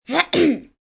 Cough Ahem, Cough, 1 child, ahem, with conviction, human voice, kid